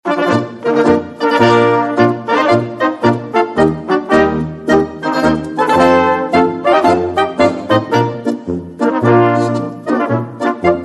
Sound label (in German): marsch